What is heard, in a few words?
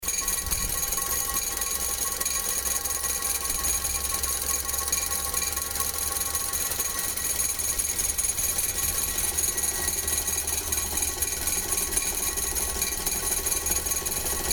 Celesta; Solaris